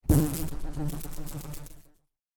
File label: insect fly buzz around close up